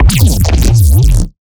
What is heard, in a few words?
Bass Shot; neuro; BIG5AUDIO